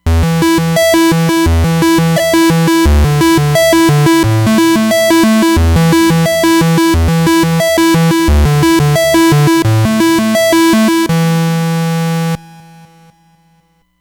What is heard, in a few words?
Sqr Seqence